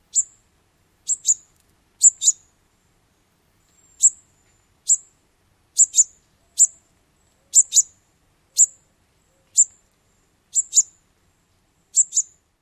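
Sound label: more calls, bird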